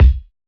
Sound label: 90s hiphop kick; Reference beat bpm90